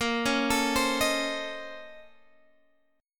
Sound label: A sharp Minor Major 11th; 18,16,19,17,16,x; arpeggiated